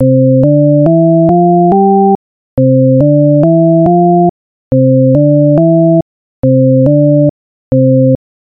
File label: doremi